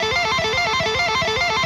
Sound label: plinking